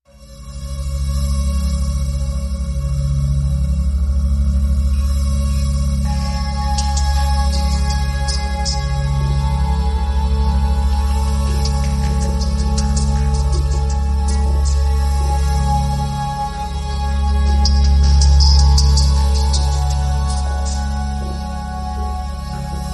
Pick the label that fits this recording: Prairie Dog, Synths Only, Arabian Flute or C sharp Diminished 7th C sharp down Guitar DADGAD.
Synths Only